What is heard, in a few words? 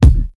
high quality perfect kick drum single hit d sharp key